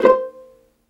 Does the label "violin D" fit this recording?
Yes